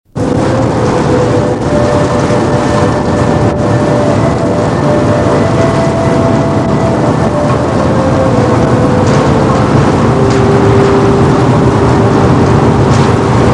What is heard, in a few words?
noise